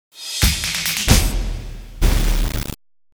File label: Logo